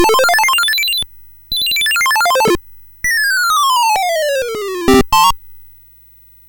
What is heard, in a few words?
Musicbox, sid MOS6581R4